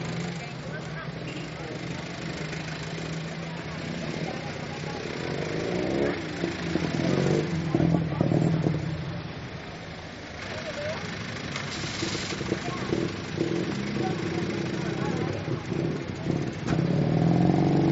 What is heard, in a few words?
tuk tuk